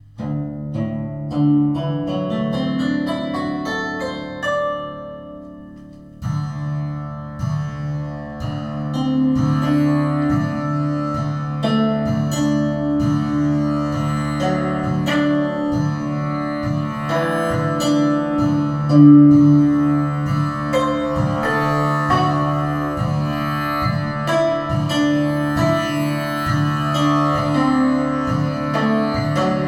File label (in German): Pentatonik Anhemitonisch Weitere